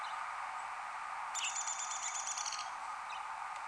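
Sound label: Downy Woodpecker trill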